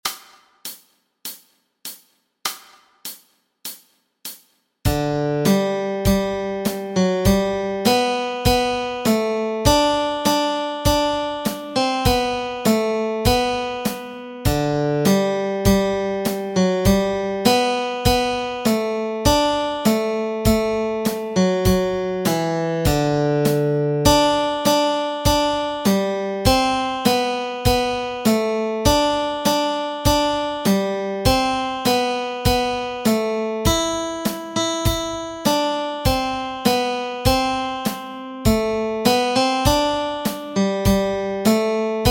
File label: melody